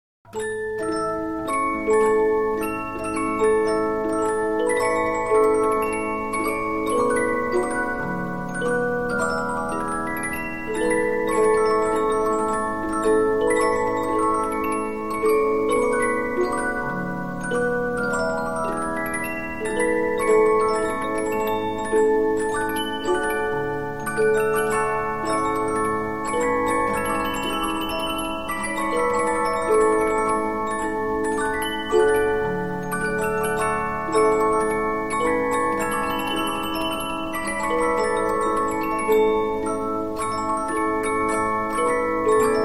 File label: Polyphon, 15inch disc musicbox